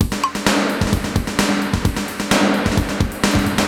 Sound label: Spring Verb HR-16B (130BPM), cyborg beats; musicradar